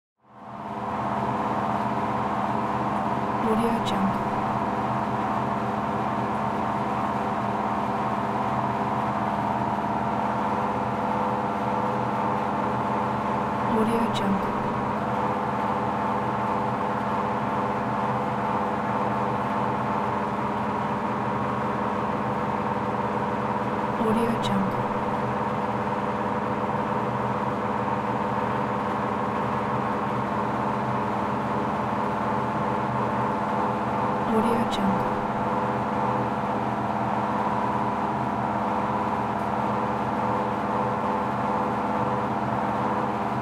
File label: industrial conditioner